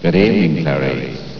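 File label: clarinet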